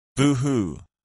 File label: boohoo